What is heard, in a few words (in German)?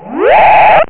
garagenöffner; Nordlicht Spiele 10-03